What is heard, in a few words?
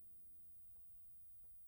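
Contrabass DI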